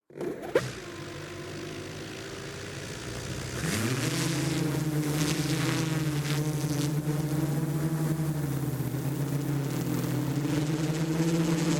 Quadcopter Start Idle Take Off Maneuver Land Onboard Ext; Audio Shade; Quadcopter Flight